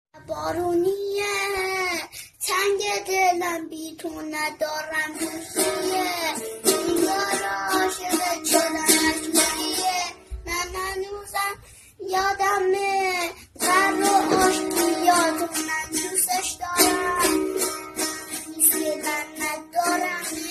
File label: Guitar